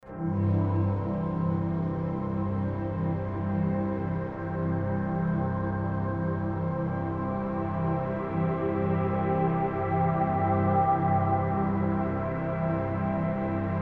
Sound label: Very uplifting chords, bamboo flute; Warm Ambient; Ambient SoundScapes